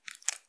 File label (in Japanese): 深めにストローク, ピストン運動 激しめ